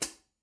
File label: Foot Hat, Live Trip Hop Drums; Drum Samples; Smoke Signal